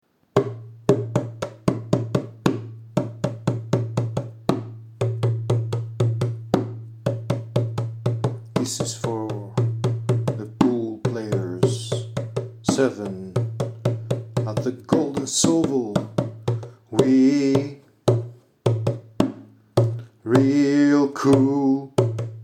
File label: Percussion Version; We Real Cool